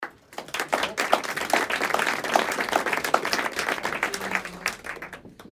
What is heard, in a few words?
applause small